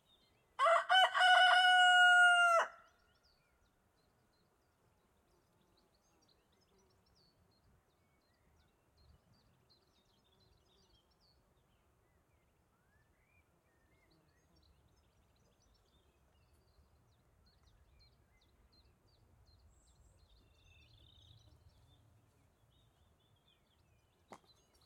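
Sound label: Ambience Countryside Day Rooster Birds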